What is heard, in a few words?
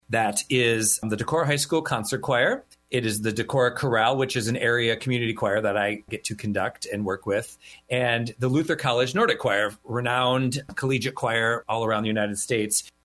Sings